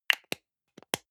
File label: deo can lid close medium, badezimmer; haus-garten